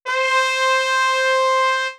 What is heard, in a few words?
c trumpet